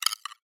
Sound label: xen infest click; infestation; Half Life Alyx